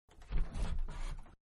Front Door-Open; Miscellaneous Doors (Sound Effects:Doors); Manhattan Production Music

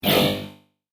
Multimedia Deep Pulse; Multimedia Effect; Laser Sound Sound Effects; electronic; beeps, bleeps, blips

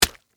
Impact Splat Orange, Texture, Fruit; Splats (Sound Effects:Objects); BlastWave

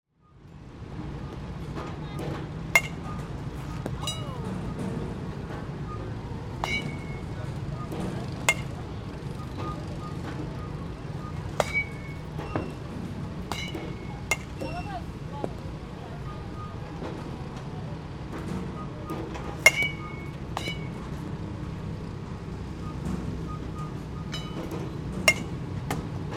Batting Cages, Sports, Ambience; Baseball; BlastWave

